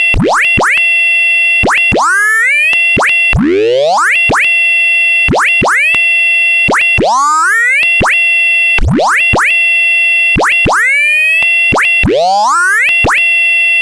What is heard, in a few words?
cyclebox tri square feeding pitch of 2nd cycbox tablevowels